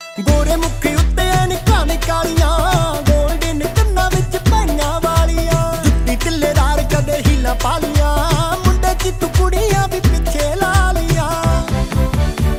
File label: DJ Punjabi Ringtone